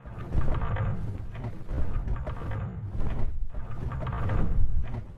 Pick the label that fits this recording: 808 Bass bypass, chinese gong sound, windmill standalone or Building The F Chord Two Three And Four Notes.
windmill standalone